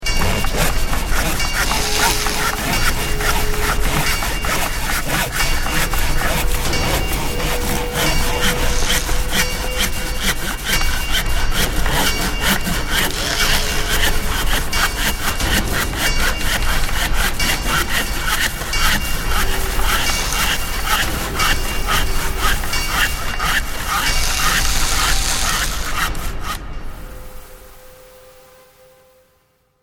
Large Construction Workshop, Busy and atmospheric sound of various tools and clanging